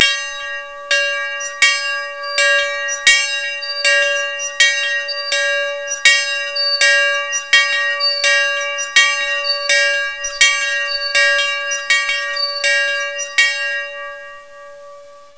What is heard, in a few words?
Long Bell